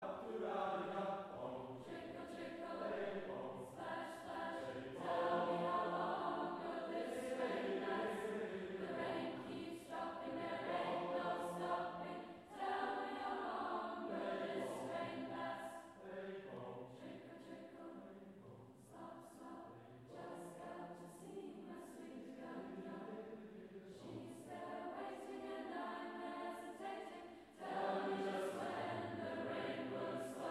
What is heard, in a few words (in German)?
Chor; musikdarbietungen bei der kunstnacht